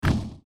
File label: Lava Destroying; my cute commissar 2020 gamerip